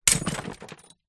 impact glass bottle hit on concrete block in plastic bin smash break